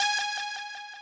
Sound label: lead